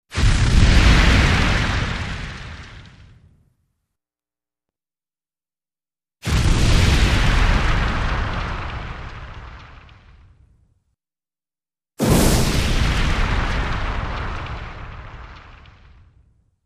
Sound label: Explosive Fire Burst, More Fire, Good For Catapults, Fireballs, 3x